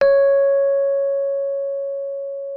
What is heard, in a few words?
MK1F C#5, RHODES; RHODES A; Xsample VOL-1